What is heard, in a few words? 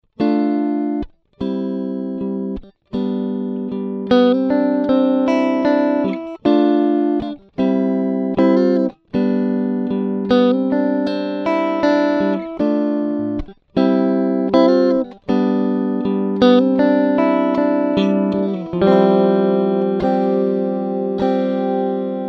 AC30 clean